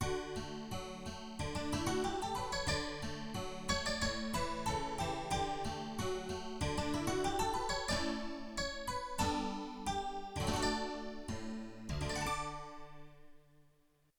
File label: Fantasy Harpsichord, Yamaha TX16W